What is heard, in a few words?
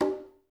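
congas, left; Spectrasonics; Liquid Grooves 1 & 2; CONGAS+BGOS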